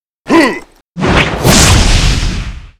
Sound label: windy giant skill; Seer; GamigoNA